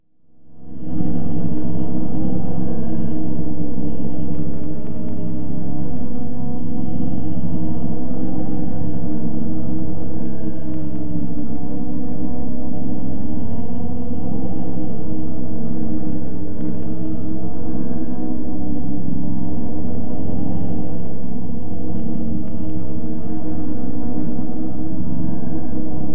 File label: droning long